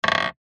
industrial metal ball bearing down on wood

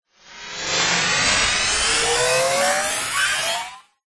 science fiction robot recharge power up fast

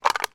empty drinks can crush; foley

